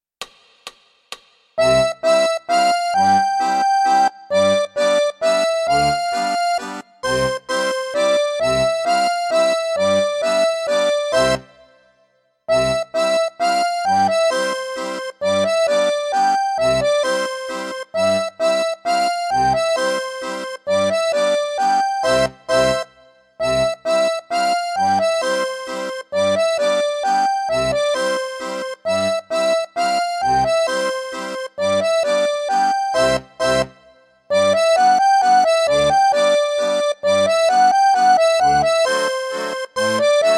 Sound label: Mazurka Brillante; Becucci; 6° Capitolo - Bassi alternati